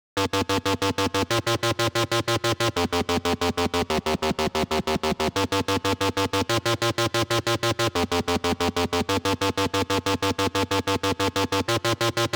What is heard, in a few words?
SYNTH